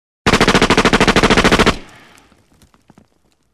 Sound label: gun